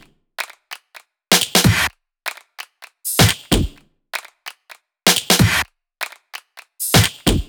128BPM Big Floor Groove Kit; Vengeance Samples

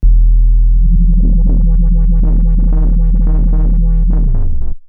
Roland B E1; musicradar-synth-samples

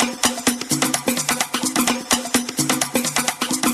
Percussive Loops 128BPM; Vengeance Samples

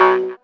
Synth Shot; Vengeance Samples

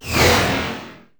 Sweeps Short

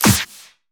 Snare; Vengeance Samples

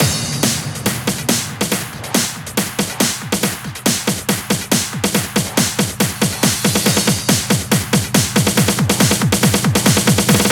140BPM 8Bars, HandsUp & Trance Fills; CheesyCrust; Vengeance Samples

